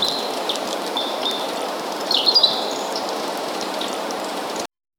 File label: an enchanted forest with, adventurous